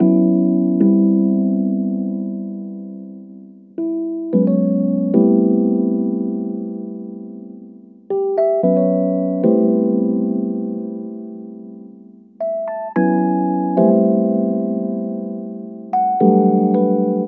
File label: keys